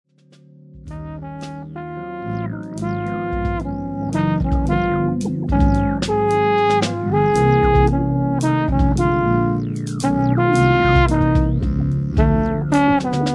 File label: trees loop